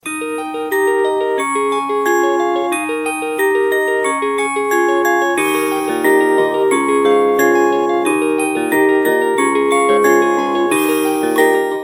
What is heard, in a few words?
ringtone